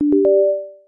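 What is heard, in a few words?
sine, Variations on a ringtone; tri-tone; Sine #2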